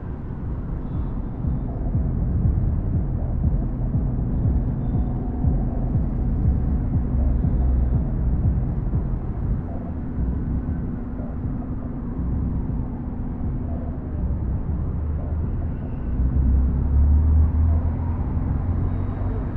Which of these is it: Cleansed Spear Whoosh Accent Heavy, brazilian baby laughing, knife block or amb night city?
amb night city